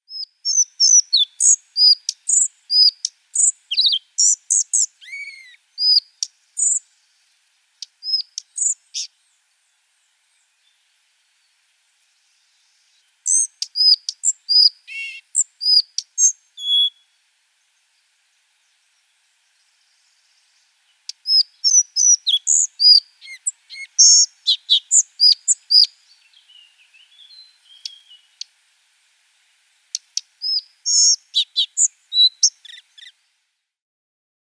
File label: Collared Flycatcher song